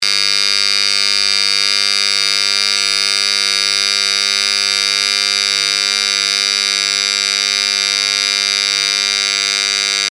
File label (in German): weckton; deutsches uhrenmuseum